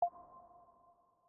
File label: notification